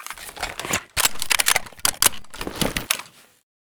sa58 reload, weapons

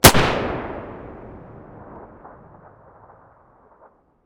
beretta muzzle mono distant, weapons

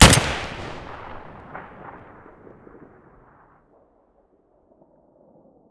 svd muzzle stereo, weapons

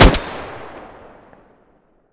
groza muzzle mono, weapons

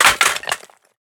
riffle drop tile; STALKER; IWP

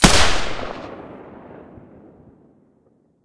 silencer g36 mono, weapons

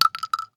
shell 12ga default; STALKER; IWP

